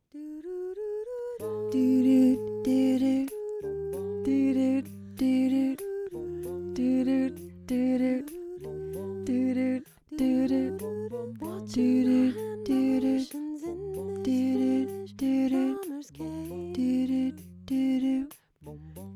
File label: low harmony